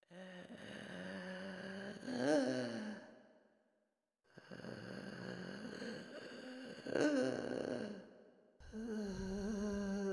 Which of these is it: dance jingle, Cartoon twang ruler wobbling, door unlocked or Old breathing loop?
Old breathing loop